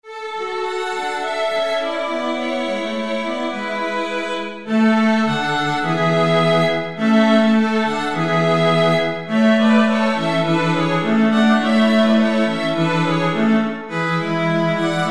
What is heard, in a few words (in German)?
streichquintett